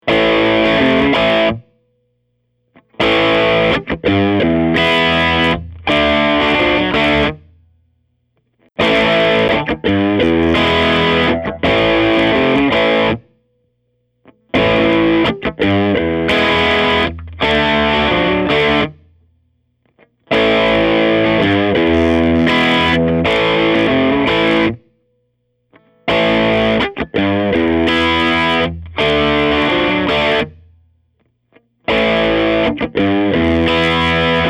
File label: Main Riff